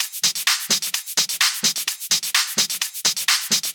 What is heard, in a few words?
Shaker Loop, 128 BPM; Vengeance Samples